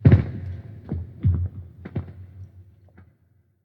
Random Ice

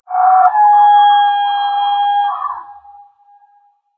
Underwater Creature

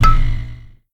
Widget Impact; moul

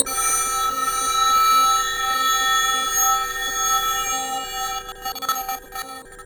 Light Short

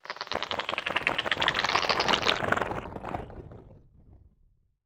Creak

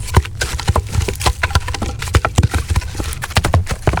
Log Dirt Roll

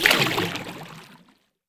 Deep Water Walk

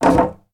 Plank Hit

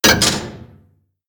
Floor Elev Countdown

